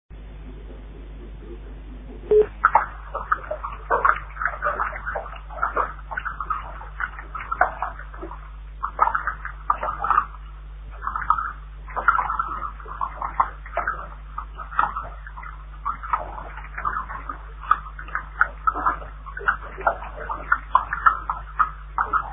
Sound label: glacier